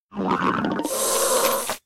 destroy

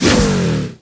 Kiwi Transform Jump

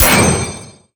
Alchemist Explosion

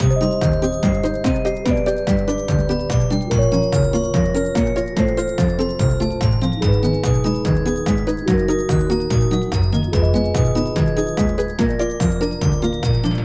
Bgm bonus time; ovenbreak

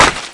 Rock Button; PDragon

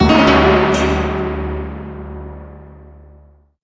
Result Fail Jingle; Gym Break

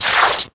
slide; Snakefruits cookie

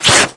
jump; Snakefruits cookie

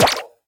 Big Bear Jelly

